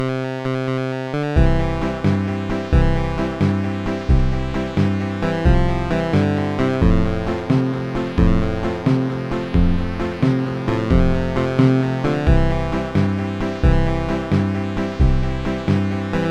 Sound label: old country song; MusicLine